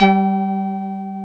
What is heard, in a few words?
BELL; East West; Global Sounds